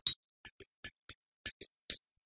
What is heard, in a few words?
Glockenspiel